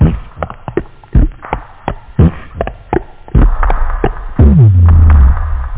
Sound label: BEATBOX, LOOPZ; PhatTrax